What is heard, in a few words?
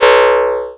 Fail